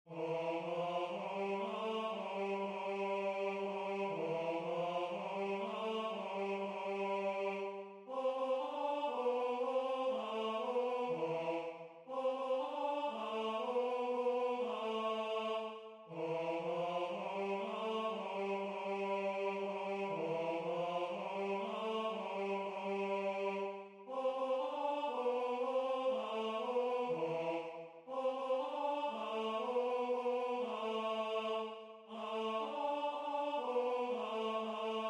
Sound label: Tenor; La Caccia